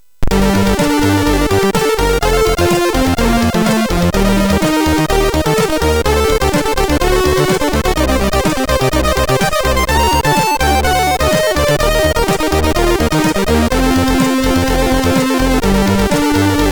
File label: Sad, MOS6581; MOS6581R3; hvsc